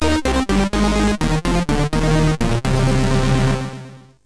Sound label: sid MOS6581R3; Paranom